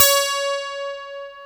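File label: 12 string electric acoustic guitar D2; EL-AC12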